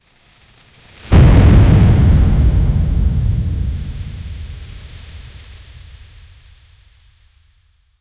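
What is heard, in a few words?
powerful trumpet, apocalyptic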